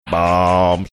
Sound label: drawn out bomb